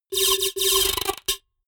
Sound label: typewriter sequence